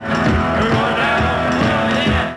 Restore Dn

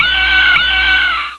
Squak; Realmz 6.0.3; Grilochs Revenge

